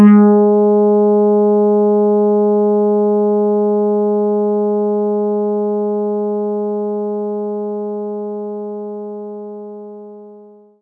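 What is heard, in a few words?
Fretless Bass G sharp 4; Knights Try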